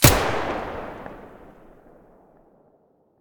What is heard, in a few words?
vihr muzzle mono, weapons